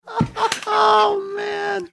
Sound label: laughing saying oh man